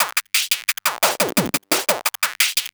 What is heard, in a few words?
PO Drums, 175 BPM; loops; CHIPSHOP DELUXE